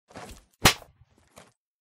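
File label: sounds of a high five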